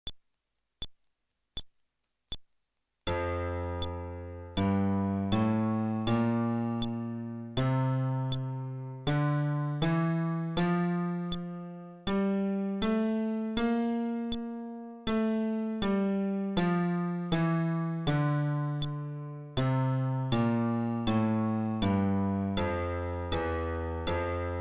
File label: Scale テンポ80, fretless bass